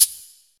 Shaker Sound F Key, Royality free shaker hi hat tuned to the F note. Loudest frequency: 9035Hz; percussion